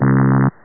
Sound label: last fall; California Games II